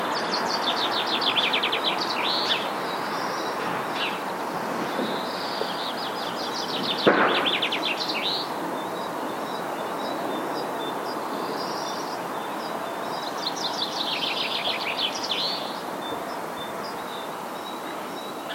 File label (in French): pinson arbres moineaux; haute ile; spots franciliens